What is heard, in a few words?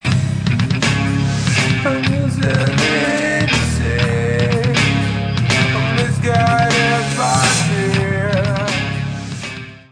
crunch, Amplis Mesa Boogie; A crunch sound made by the Lead 1 channel, with the / volume of the guitar rolled back. This gives a crispy sound